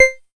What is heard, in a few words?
TINY C5; Q Up Arts; Dream Experience